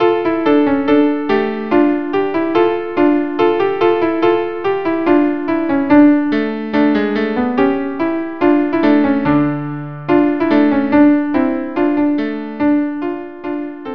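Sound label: violin viola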